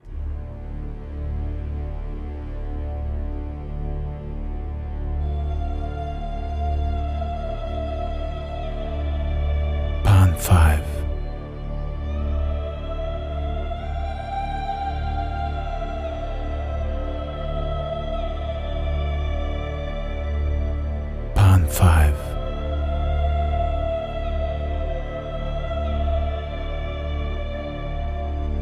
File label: ashes dramatic female vocal